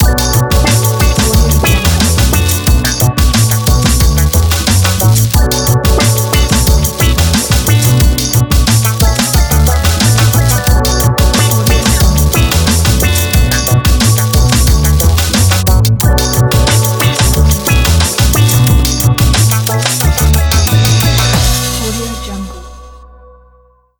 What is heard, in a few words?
funky hiphop ident; andyslatter